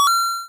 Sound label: notification